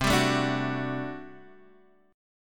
G Major 7th Suspended 2nd Suspended 4th C

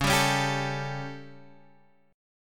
G Suspended 2nd Flat 5th Db, chord

